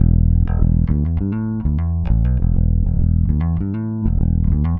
Electric Bass; Basses

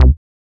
Synth Bass Single Note; Basses

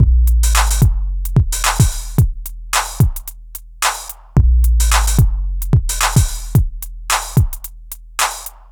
Urban Beat, Drums

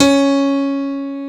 M1 PIANO; SoundFactory

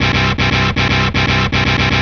Electric Guitar, Loops; Guitars

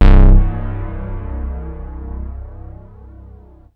BASS; SoundFactory; SMPMANIA

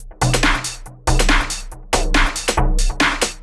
Electronic Percussion

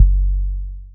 Kick One Shot, Drums

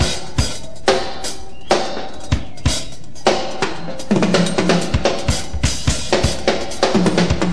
Old School Break, Drums

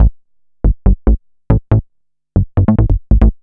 Synth Bass; Basses

